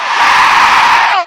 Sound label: slasher ghost attack